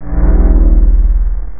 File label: cloak, ships; ilwrath; sc2